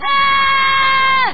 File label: scream; nickelodeon game; insanitation; zim